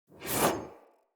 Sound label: Chop Entrance Axe Whoosh; Sinecure